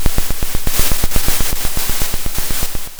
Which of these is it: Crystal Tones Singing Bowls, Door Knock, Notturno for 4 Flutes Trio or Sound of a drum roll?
Sound of a drum roll